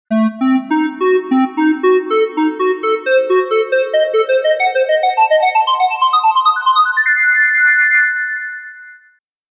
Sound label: thinking